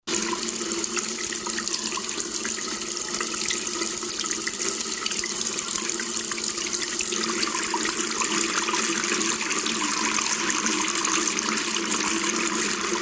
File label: Water in a sink